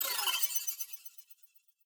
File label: teleport